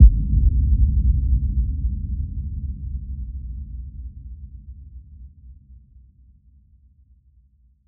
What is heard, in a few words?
Impact Darkness; Riddim; One-Shots; SFX; Ghosthack; Riddim Essentials Volume 2